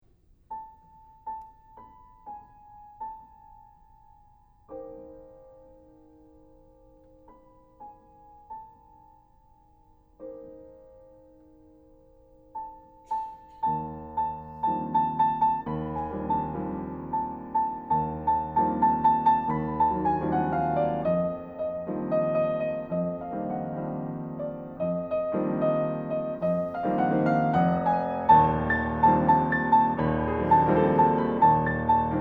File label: Waltz